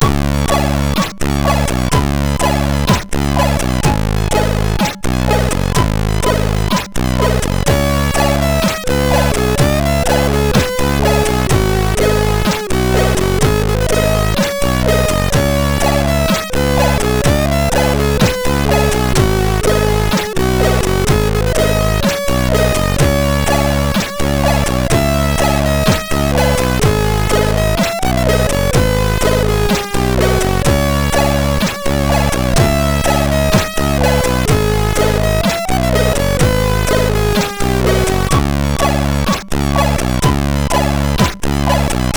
Reggae sid MOS6581R4

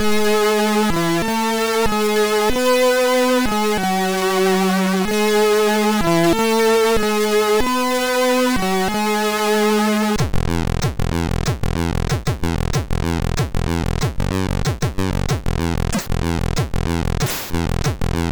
MOS6581R2, sid; Metal Sky

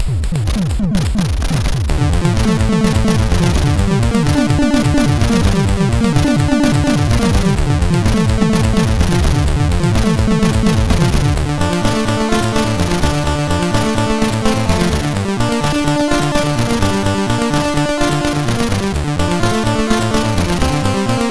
Knock Remix sid MOS6581R4

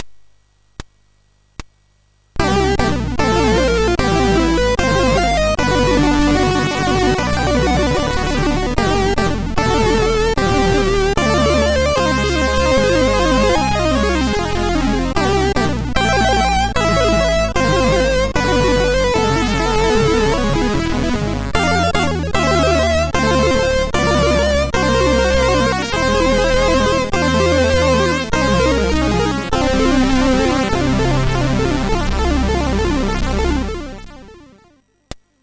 D50 tune sid MOS6581R4

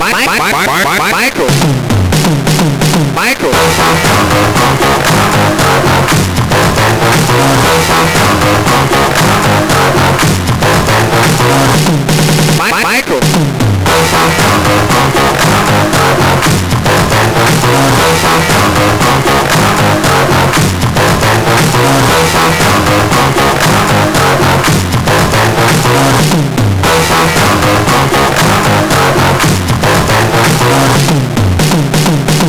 Old Micro Digi, MOS6581R4

